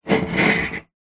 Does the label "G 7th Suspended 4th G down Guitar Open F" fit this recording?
No